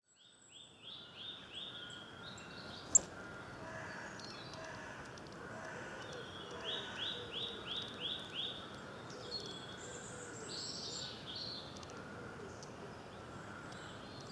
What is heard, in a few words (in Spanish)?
Sonidos de aves